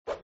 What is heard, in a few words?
swoop forward